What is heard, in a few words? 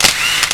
Camera Click Melody; Siemens SL91